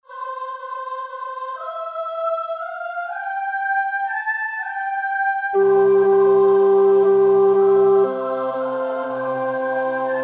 In dulci jubilo vers 3 (tenor 1); Praetorius (Michael)

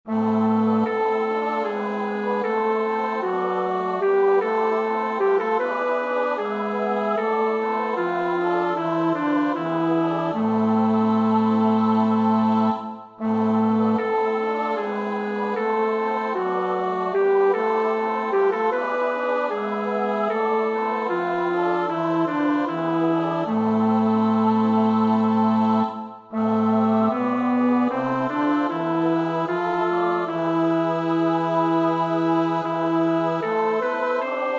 bas, koorpartij oefening; Bach (Johann Sebastiaan); BWV 183; Du bist ein Geist, der lehret

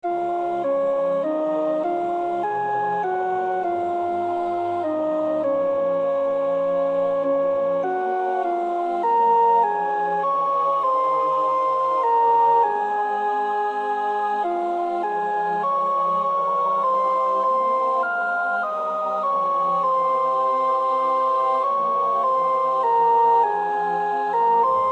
sopraan, koorpartij oefening; Stainer (John); The Crucifixion

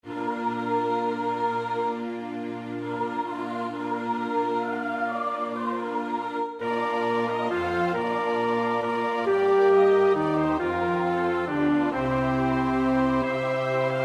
bas, koorpartij oefening; Purcell (Henry); Dido and Aeneas

